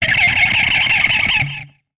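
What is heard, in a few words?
Music Guitar Pick Scrape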